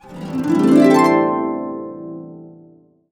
C, Harps; USB Soundscan vol.62; AKAI

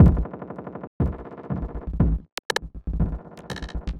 Subtractive Beat

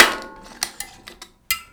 Finger Knocks; Battery 3 Sample Kits

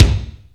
KIK, GATE KICK

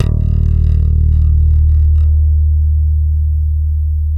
FUNK E, Bass; Spectrasonics; Bass Legends

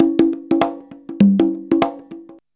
CONGA, Modern Percussion Loops

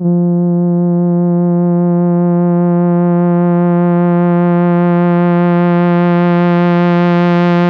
SL SWELL F2, SYN STR

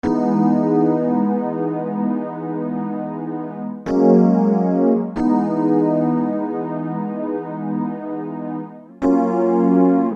Keyboard, Soul

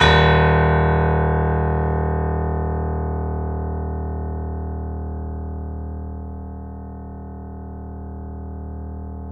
D#, The Piano; InVision Interactive

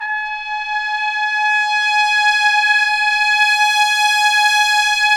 BRS TP SWE, Orch Ensembl; Roland L-CDX-03 Disk 2

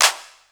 CL, Casio CTK-5000

